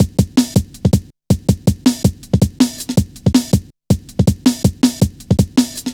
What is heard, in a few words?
drum loop, 161.5; Zero-G Jungle Warfare 1, 2 & 3